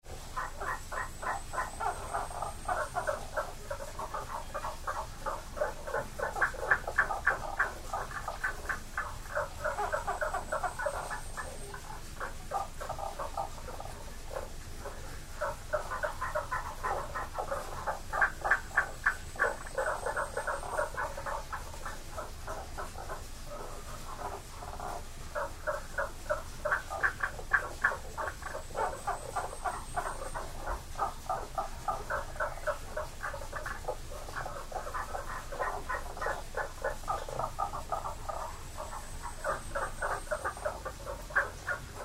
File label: Frogs in a Shrine